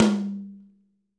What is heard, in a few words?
TOM H, LA TOM